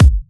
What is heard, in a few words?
Soft Kicks; Vengeance Samples